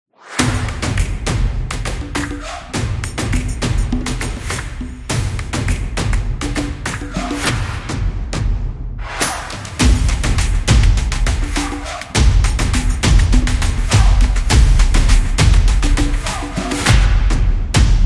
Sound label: Action Drums